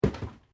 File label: Cardboard Box On Box Stack; Material components for constructing more complex sounds; SoundEffects Foundation2